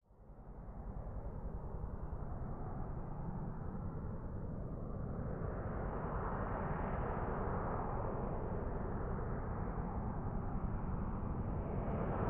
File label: Harrier: Land / Off; Long Approach And By With Distant Throttle Down And Low Whistling. Medium To Distant Perspective. Jet.; av-8b harrier